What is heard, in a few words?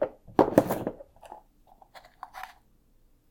from s drawer